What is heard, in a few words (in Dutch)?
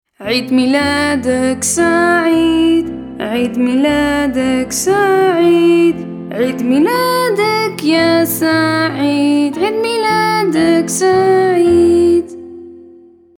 happy birthday marokko